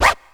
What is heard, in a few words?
DR-660 DNC, DRUMS PERC; BOSS; Rubber Chicken Software Sample Bank